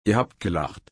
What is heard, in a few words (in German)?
lachen